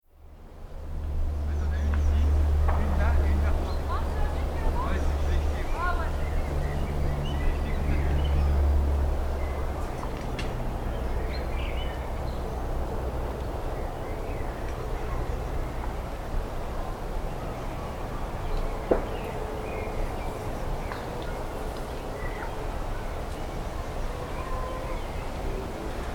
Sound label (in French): golf chemin; cartophonie